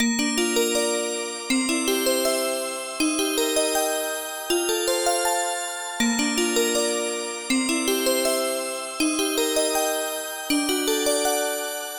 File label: Chords and Bells